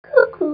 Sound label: cookoo